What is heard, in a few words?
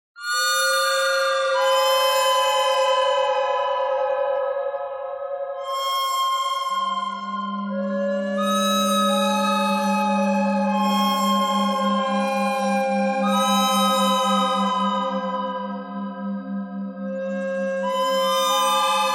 bird thread pentatonic; noreverb